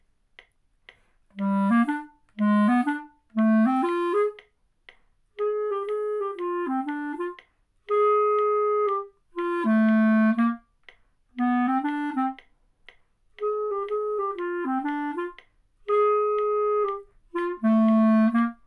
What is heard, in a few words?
with metronome; Clarinet Student Resources